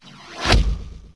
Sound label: sports asterisk, windows 9x sound schemes